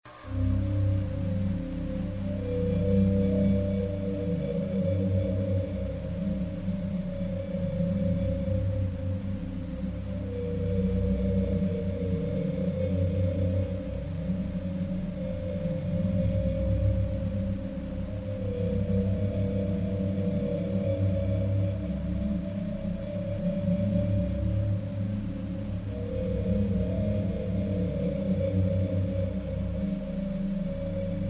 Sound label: Cafe